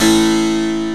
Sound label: HARPSICORD